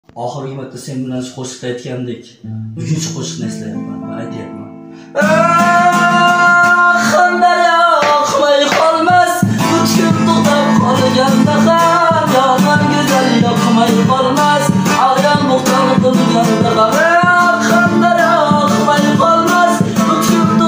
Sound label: cover gitara